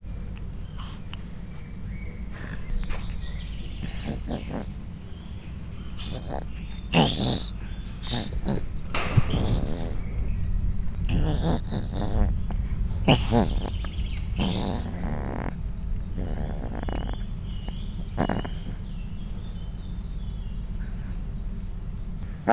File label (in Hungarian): harom hetes kolyok par centire a mikrofontol, leopard; miskolci zoo 2009